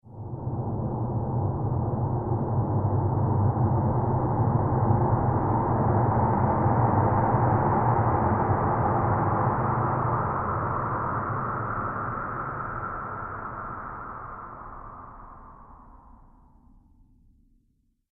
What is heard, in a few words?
Graveyard Wind; Scary Soundscape Sound Effects